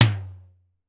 Tom Hi, Pitched Down; Clavia DDrum-AT

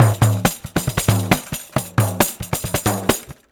Pandeiro, 4-4 Drum Loops, Perc Loops; Drum Tools

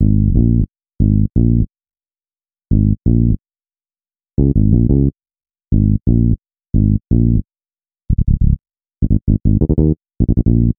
Bass, Hip-Hop

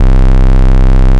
MONO POLY

